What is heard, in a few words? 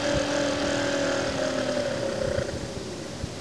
fan; altair emu